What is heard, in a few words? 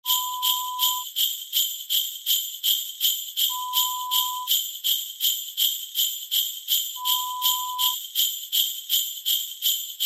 Christmas Sleigh Bells